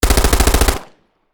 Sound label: colt 9mm shots